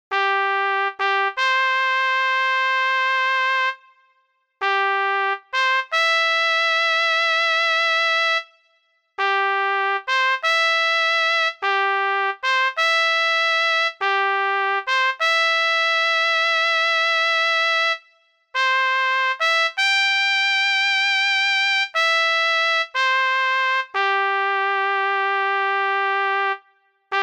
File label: TAPS; America